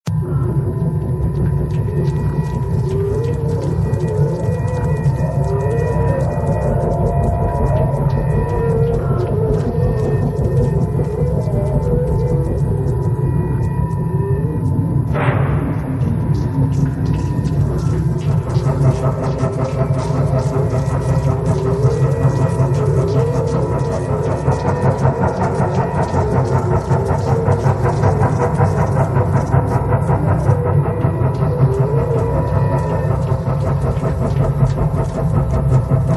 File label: Factory (Ambient); Shadowgun